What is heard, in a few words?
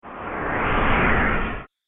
Sci Motion Flyby, whoosh sound effect; Andrew Fleming